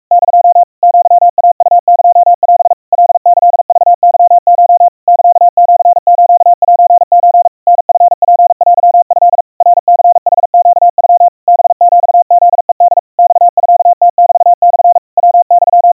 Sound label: aiueo 150 cpm 700 Hz Crispy, Morse